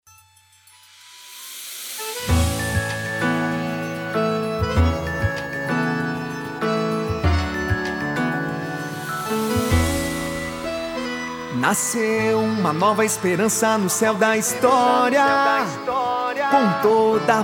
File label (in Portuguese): Jingle PREFEITO; Pra fazer diferente; RENOVACAO